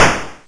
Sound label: shoot